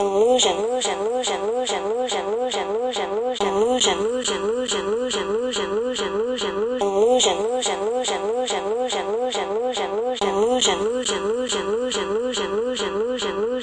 141 illusion echo vox; VOCAL OR VOXY LOOPS

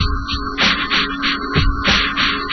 mellowchord groove, 95 bpm; 091 to 100 bpm

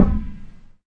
yogaball, Big Rubber Yoga Ball

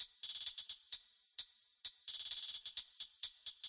130 bpm verby trap hats; 121 to 130 bpm

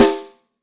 metal sd, Snares; single hits

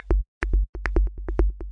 drum clicks

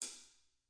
hat, DRUMS, REAL LIVE KITS; Osama Qureshi DrumKit

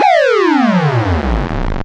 Electro FX; Ueb HE Electro FX

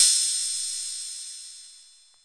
Korg KPR-77; DRUM MACHINES

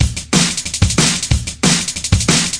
break jumpup, DnB Breaks, 184 bpm; 141 to 185 bpm; Ethex

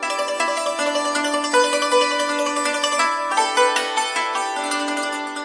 koto sounds; WORLD

